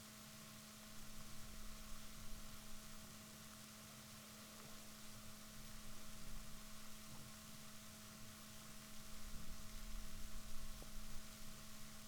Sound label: rorquals negatif, Balaenoptera Physalus Chile